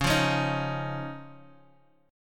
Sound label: Db Major Add 9th, Guitar Open G